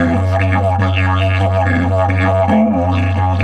F 140, DIDGE RIFFS

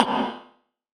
rim, gated reverb; musicradar

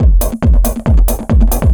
138, Techno, drum loops

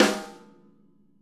SNARE, Interactive Drum Kits

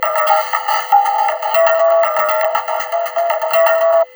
RYTHM 120, Drum Loops Crazy Processed; USB Soundscan vol.07; AKAI

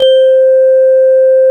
Korg CX3, C 1

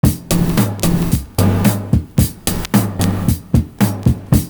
LOW TOM ON 2, loop; Univers Sons - Kitch 70s

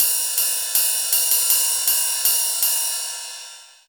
Fizz Ride, Cymbal; Zero-G Smoke

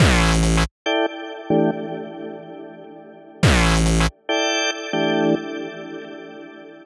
140 Am, Melodic Loops; M8; Electronisounds

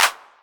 Clap, Novation Drumstation; Novation DrumstationV1

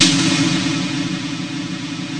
ELEC; East West - Global Sounds; SPATIAL

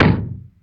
Impact, Classic SFX, cartoon; musicradar; cartoon caper

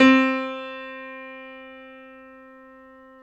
PNO, Grand Pno; Club-50 - Foundations Roland

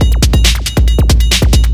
drum 138, drum-loops, 2bass, Techno; Techno ID

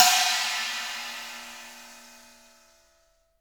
china splash

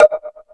House Machine, E-MU Pro-Cussion

